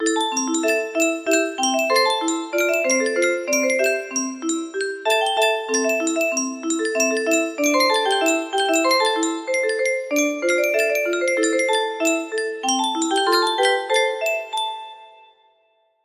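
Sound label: music box melody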